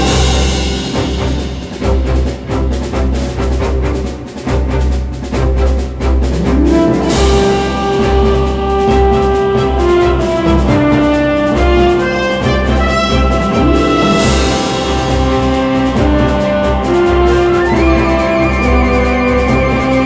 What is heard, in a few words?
Cheer Up Theme Loop